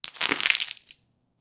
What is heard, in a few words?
earthy rip out thick root